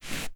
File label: step slide, zombie; creatures